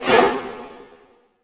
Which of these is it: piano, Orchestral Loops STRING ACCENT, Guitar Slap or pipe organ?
Orchestral Loops STRING ACCENT